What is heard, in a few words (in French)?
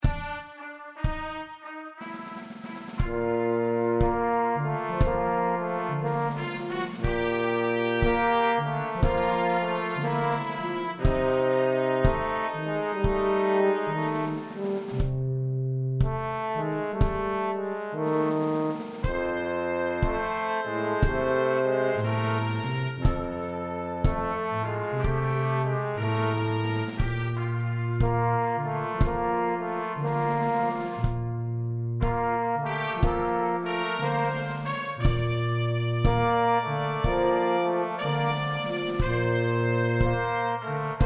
tuba batterie trompette trombone